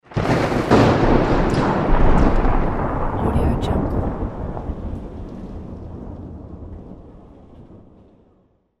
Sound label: thunder